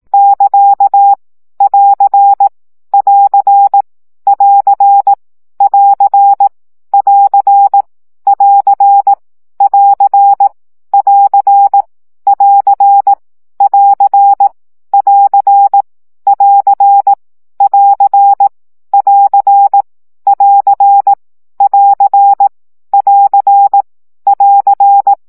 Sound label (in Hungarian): CW gyakorlas plusz 15 baud